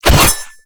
Machete Whoosh, Weapons Melee; Warframe; Kahl Machete

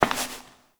Step Perforated Metal; Warframe; Koumei

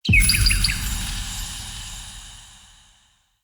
Cardinal Male Pickup; Objects; Thawtide

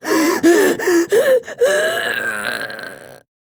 Death; Warframe; Shik Tal; Battle Barks; en

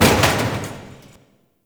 Destruction Small Metal Hits; Ambience; Warframe; Corpus Railjack

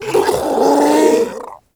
Infested Ancient Strike Scream; Warframe

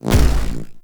Hoverboard Land C; Vehicles; Warframe; Venus Hoverboard

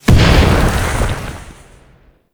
Sonic Dissipation Proj Explosion; Warframe; Focus; Vazarin

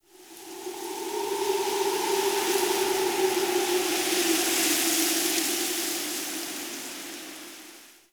Night Wind Gust Medium; Ambience; Warframe; Eidolon Plains

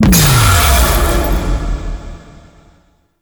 Void Catch; Enemies; Abilities; Ethereal; Beacon; Warframe; Zariman; Void Angel

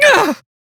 Pain, Male; Warframe; Railjack; Perrin Sequence

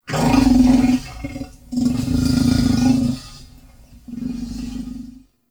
Wolf Ambient Call; Animals; Duviri

